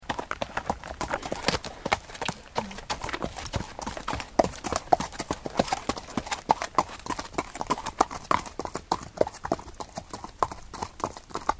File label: Horse riders